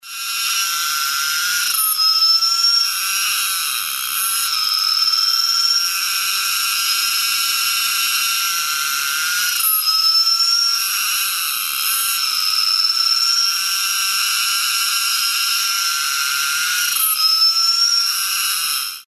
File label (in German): bako nationalpark sound regenwald